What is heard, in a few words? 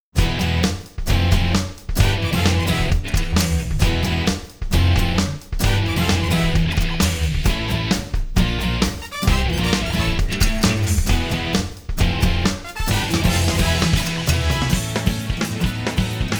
Fast and low